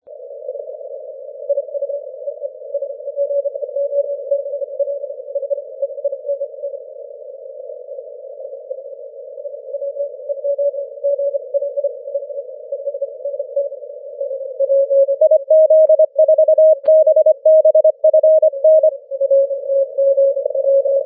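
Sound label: 20m Morse code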